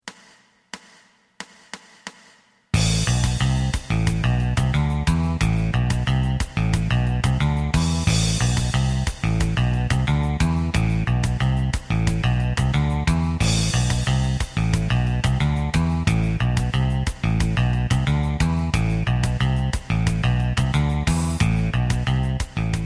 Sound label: lead